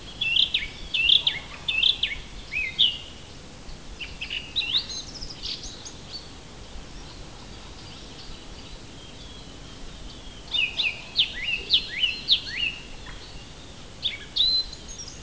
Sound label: Turdus philomelos